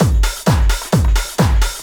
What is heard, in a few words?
techno, DRUMLOOPS